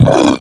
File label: sleep hit